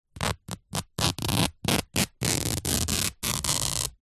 foley fabric towel rip

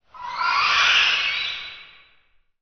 sound design horror realisation shrill; fork media

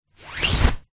foley flag movement hands pull through; zapsplat

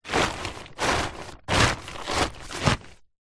foley tarpaulin sheet large short movements; zapsplat

